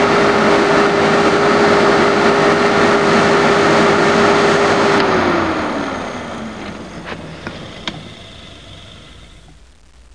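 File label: old pont, transport; Sound Library 2000; Wayzata Technology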